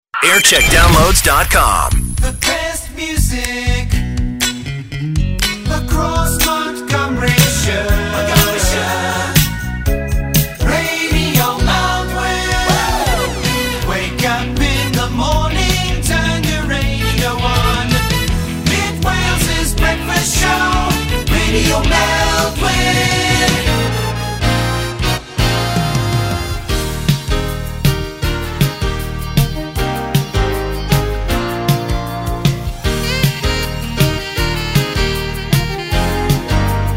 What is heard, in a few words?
jingles; Jingle Montage (JAM Creative Productions) - Jul 1st 1993; 1990s